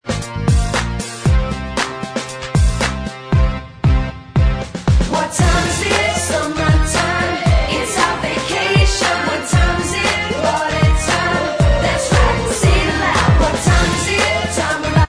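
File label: instrumental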